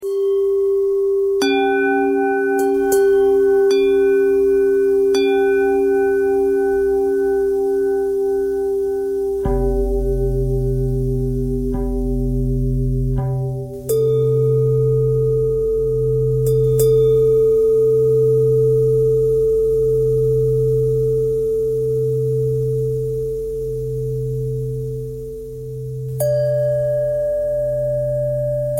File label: bell bend threads